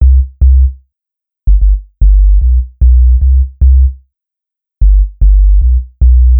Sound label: Sub Bass, reggae; регги